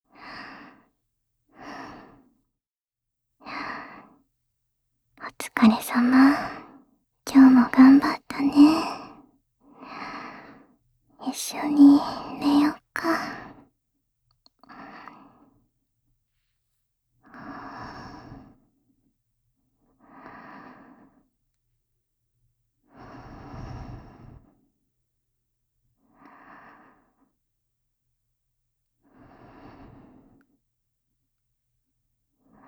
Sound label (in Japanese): 寝息ループ音声; 安眠確定